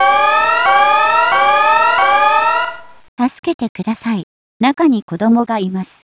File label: Kid Bus; 園バス置き去り防止のための安全装置のご案内